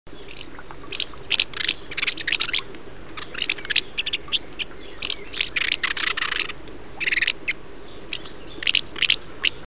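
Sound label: voronok golos, house martin voice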